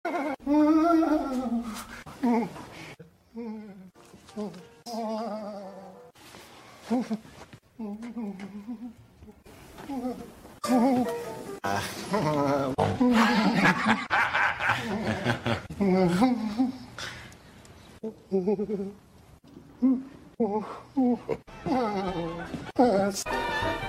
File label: laugh; mickey doyle